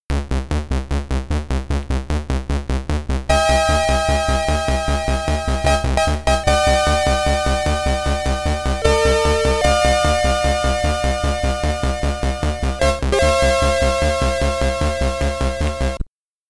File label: Warp (SID Stereo)